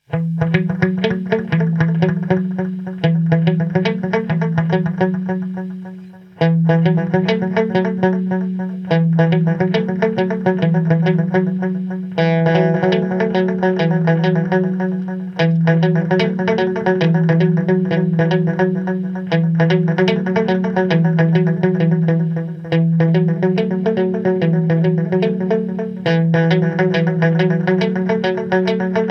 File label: tea in the guitara